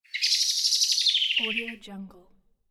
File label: blackbird; greenwoodsound